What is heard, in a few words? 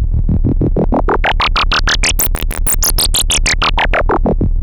Moog E2; Hollywood Edge; Giorgio Moroder Rare Synthesizer Collection; MLTI.MOOG